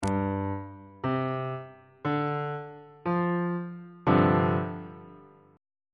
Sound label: f double sharp dominant 7th suspended 4th chord on bass clef